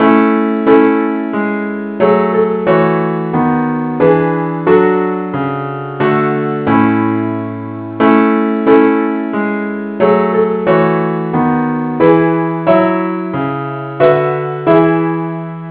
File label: parts only key A